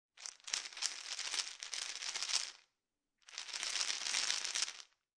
Pill Bottle Shaking Bottle, Foley, Pill Bottle, Plastic, Shaking Bottle With Small Pills Inside